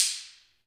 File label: DRMSTCKS, wood; Spectrasonics; Heart of Africa 1; AF.WOOD